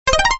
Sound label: sound Wand; jungle fruits